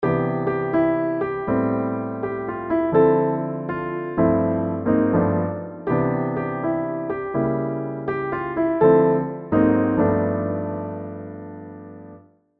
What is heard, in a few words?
Piano